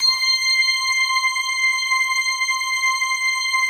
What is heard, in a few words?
PAD; Best Service; XX Large; Pads